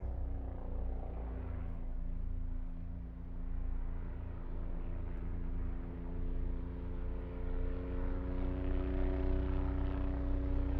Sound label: Bell 407 passby overhead fast, Utility Helicopters; ec fields